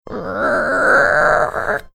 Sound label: making creaking sound